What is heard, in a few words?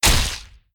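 beat dueling sword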